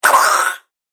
Night Gremlin, Monster; horror

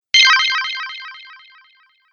Fade Away Screen, transition sound effects

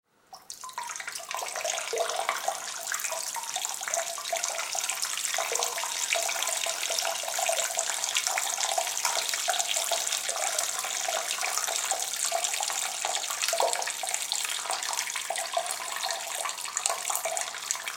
Pee Male Urinating Into Toilet In Tiled Bathroom; Urinating Sound Effects

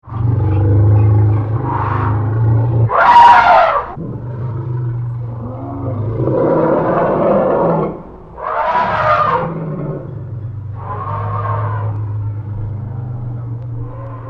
Elephants African Moan Trumpet; Elephant Sound Effects

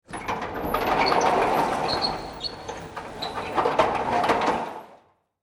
Industry Warehouse Door Open, garage door; Garage Doors; SFX Bible

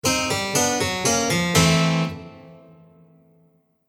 Fail Harpsichord; Musical

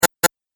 Double Click Sound

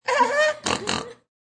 Goofy sounding snort laugh; Laughter (Sound Effects:Voice)

